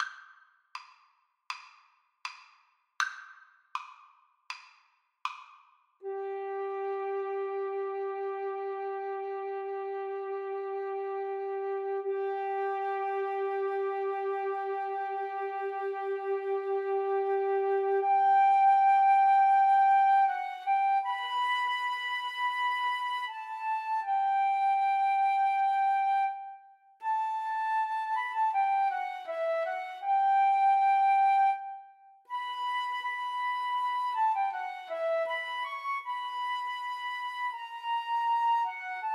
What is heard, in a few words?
Flute duet